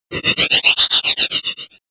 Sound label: move fluttered noisy phased